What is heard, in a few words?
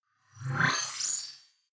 flyby splutter